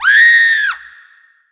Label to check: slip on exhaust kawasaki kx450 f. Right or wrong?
Wrong